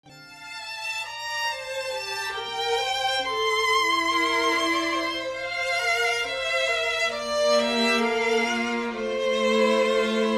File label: String sample